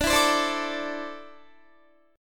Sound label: D 7th Suspended 2nd down Guitar; D7sus2; strummed